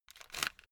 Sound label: headphone retract unsteady quick